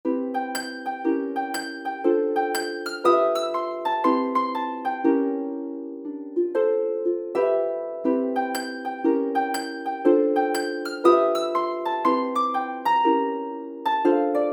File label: Little Elfy midi sample